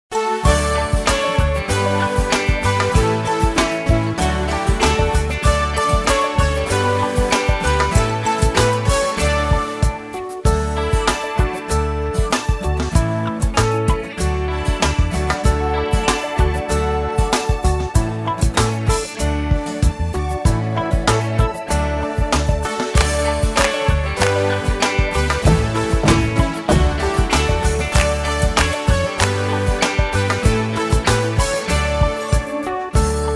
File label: Karaoke